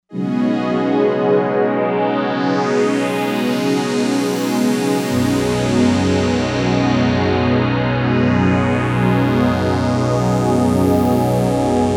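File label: Pad Sunbeam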